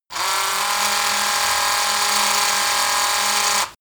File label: cordless drill low rpm; maschinen; bohrer; soundpool